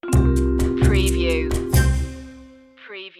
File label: jazzy opener stinger